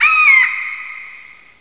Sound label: eagle idle; cs wildwest